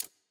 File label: Cable Rolling; Puzzle